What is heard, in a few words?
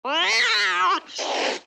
pick n click scene mansion cat